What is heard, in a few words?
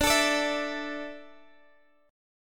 D Suspended 2nd D down, chord